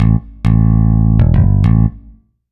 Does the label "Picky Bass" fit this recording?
Yes